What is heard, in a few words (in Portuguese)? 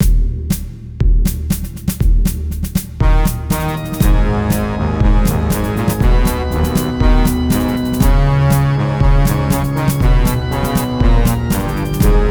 melodia e acompanhamento